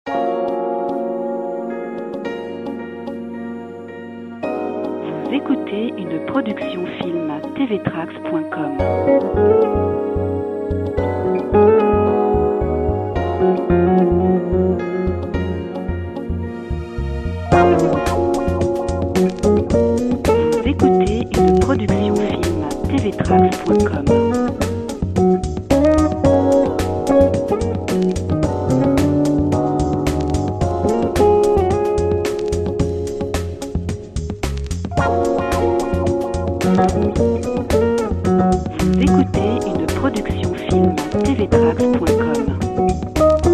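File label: jazz gtr, jazz guitar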